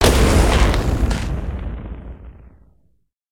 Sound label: Maelstrom Snowman, destructibles